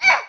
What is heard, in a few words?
damage female